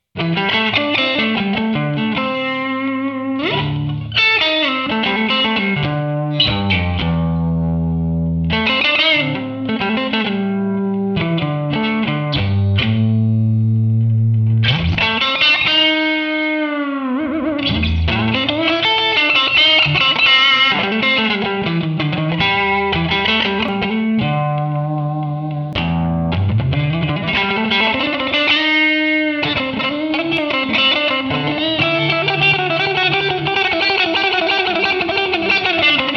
Small Room

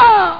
URRHHH; Future-Demo

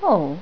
Ohhh; Piston

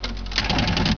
toss; Jacks! 1.1

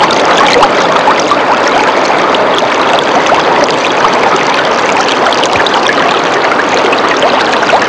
babbling brook; Sound Effects for Multimedia - Volume 1

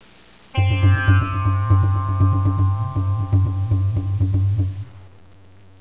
mideast

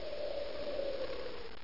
space; turbo invaders

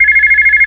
new message; Aethera 1.21

